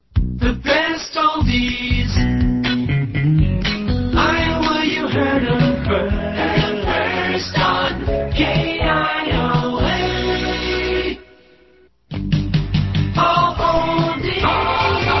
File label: jingles; kioa; jam